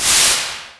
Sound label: Steam; Finding Nemo; XBOX